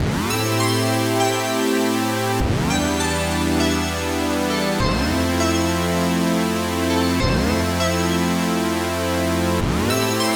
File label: Chord Loop - 100 BPM A Min, Synths; Future Bass; Chord Loops